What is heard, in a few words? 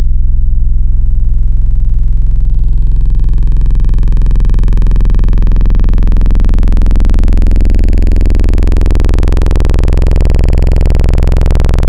Stab; Wavetables; Synthetic's Serum Collection; Cymatics - Wavetables Collection Vol 1